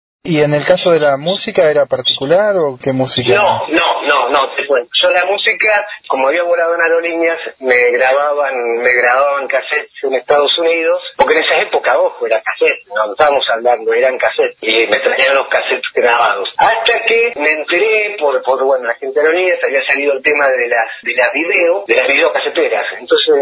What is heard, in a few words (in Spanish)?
musica; red fox pub